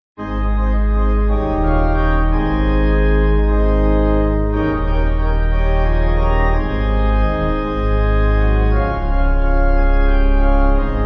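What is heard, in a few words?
Ab Pipe, Pipe Organ; 4/Ab; There Shall Be Showers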